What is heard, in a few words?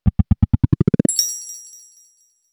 cause and effect, message tones